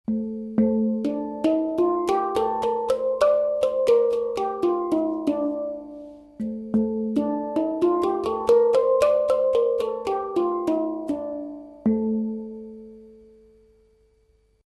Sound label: Bayati, hang